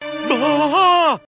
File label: bwaaaa